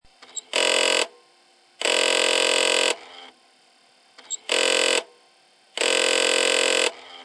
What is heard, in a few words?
Apple Disk Music; apple ii; sound sim